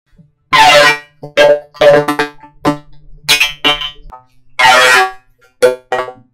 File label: alien cat